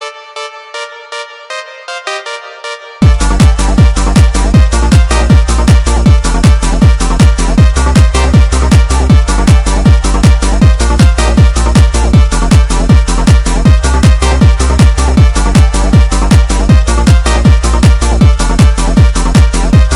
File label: trance